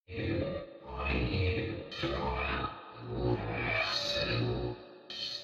alien evil; sonniss gdc

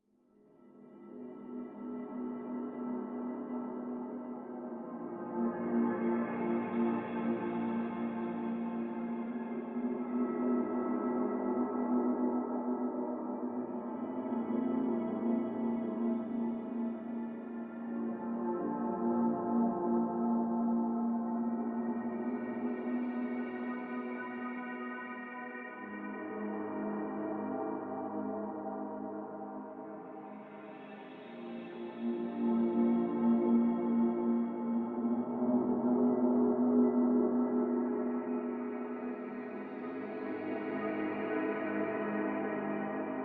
whispering music; sonniss gdc

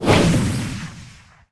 blade swing; lancer weapon fx

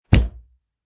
melee attack impacts bludgeoning; psfx

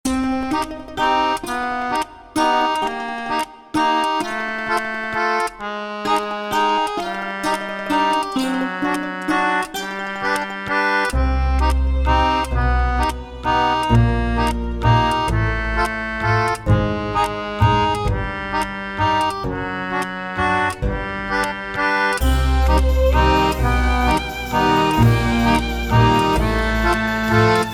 carnival loop

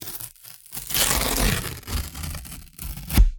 cardboard tearing continious; sonniss gdc

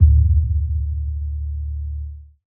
low boom; sonniss gdc

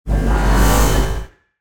starship door access; fvtt sonniss gdc audio

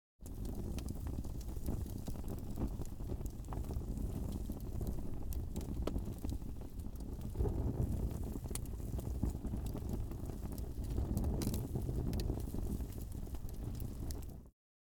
fire firewood small mono; dpa mono; fvtt sonniss gdc audio

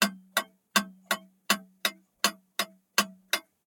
clock tick clock ticking multi loop

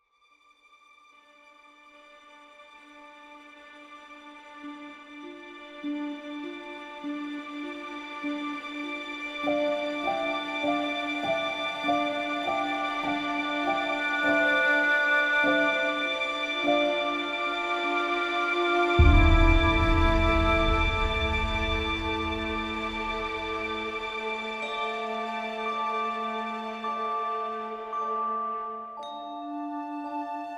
magical forest; Dragon Tales 2

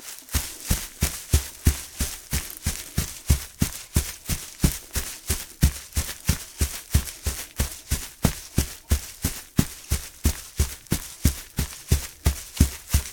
bare feet grass run; sonniss gdc

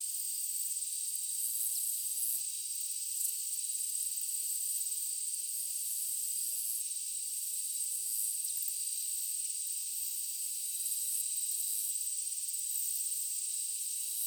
ambiance the grassland daytime

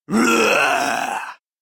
roar dry; sonniss gdc

